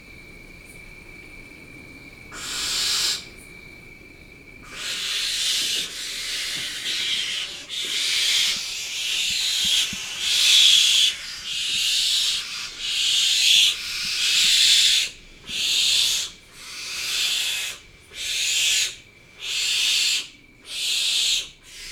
sipuha golos

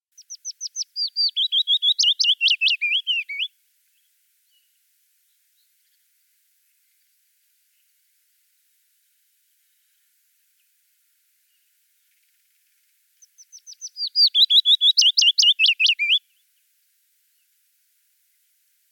penochka vesnichka golos

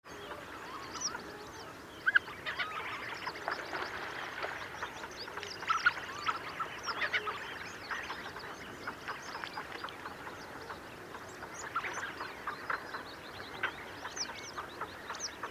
hohlataya chernet golos

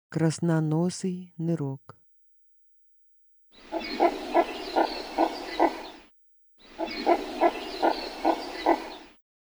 krasnonosyy nyrok golos

